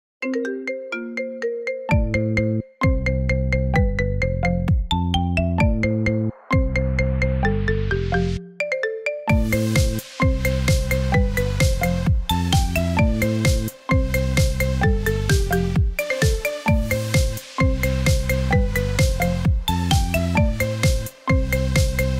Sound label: Marimba Remix